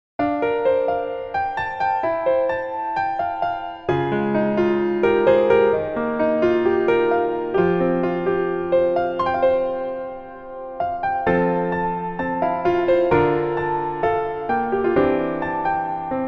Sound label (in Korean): Piano ver, 멜로디MR, 여자키